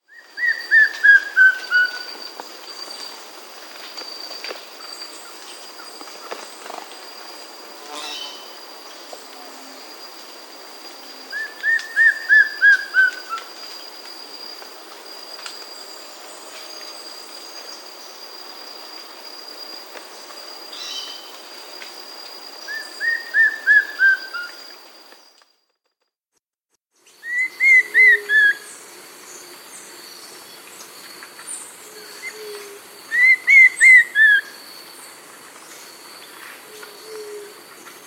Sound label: White-Spotted Antvireo; S. America